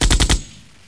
Chain Gun Sound

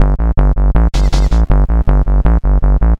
Bassline DnB

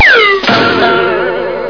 Cartoon Noises Fall

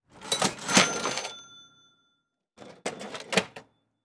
Cash Register Drawer

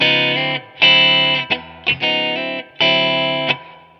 Jazz Guitar Sound

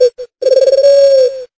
Owl Sound

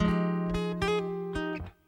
Guitar Loop

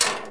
Ladder Clink

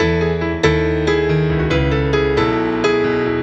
Pop Rock 140

